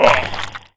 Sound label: NPC Killed